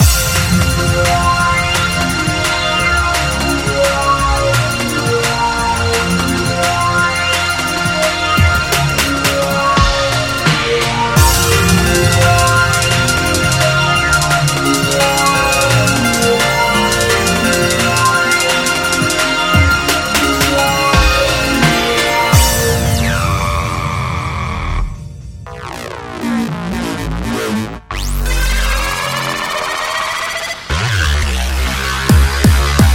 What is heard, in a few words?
drum bass; enter the hive